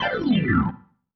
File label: Slide, Organ, Loops